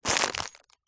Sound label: foley packet plastic cat ball bell pick up; zapsplat